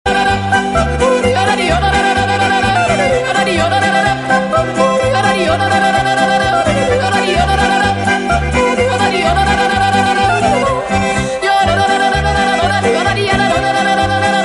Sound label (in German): ku-ku-jodel